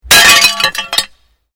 GLASS SHARD SMASH

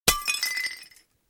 Plate smashed; Crash; Crockery; Part of Crashes (Sound Effects:Objects); BBC

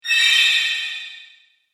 Horror Danger Sound, scary tone; Twistedtracks

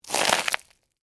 Texture, Crunch, Oyster, Crackers; Crunches (Sound Effects:Objects)

